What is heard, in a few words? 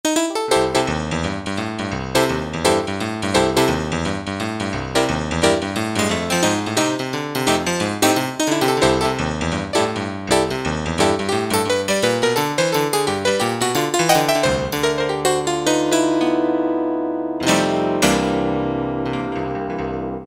Piano 3, Roland SC-50 Sound Canvas; Factory sounds